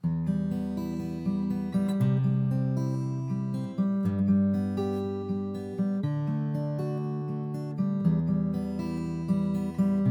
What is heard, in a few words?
Fingerstyle; Warbler 4D